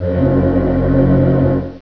low temple; Prince of Persia 2 Demo

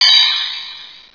Bell Tree Squish; Bells; The Complete Web Studio 2.0

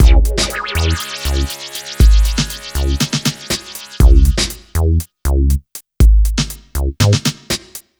groovebox

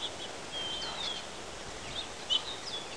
Amb Loop; MacAddict

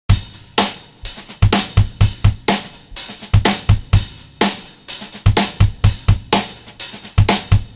loop dark groove rock; WMBonusXP

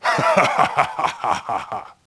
LAFF; dialog; intro; cinematic; thug; PC Gamer IT CD 36

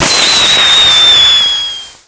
fire fire; revoltdemo

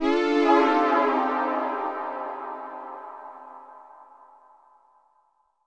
synth pad ambient 160 C; Sphere; eJay

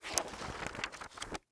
Book Pages Flip and Close, Office Supplies

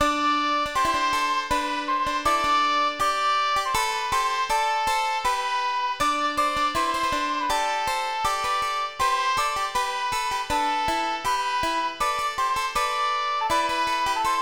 tune

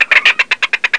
bird chicken

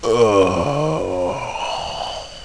plyr die; DAoC; Toa; Gamestar

